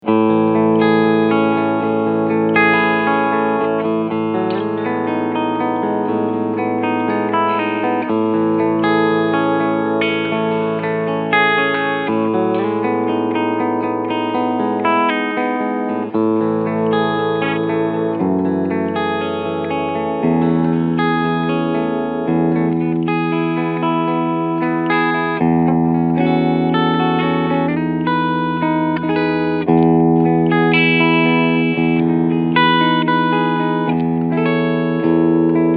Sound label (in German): Gibson Les Paul Standard 50s Cleansound Steg Pickup